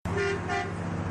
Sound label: Horn Volvo